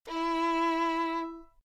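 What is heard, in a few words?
violin mi; violon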